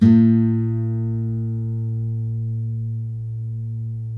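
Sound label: GUILD 12-string, Guitars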